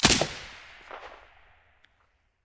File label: silencer stereo, weapons